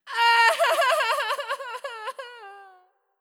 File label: Heroin Escape; Heroin Escape G